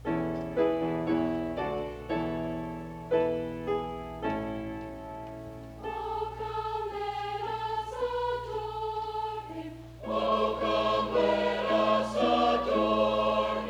Concert Choir, O Come All Ye Faithful, XVIII Century Carol